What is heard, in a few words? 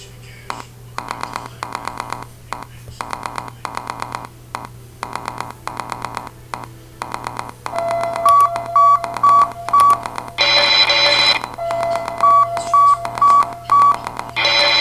Sine Wave Assignment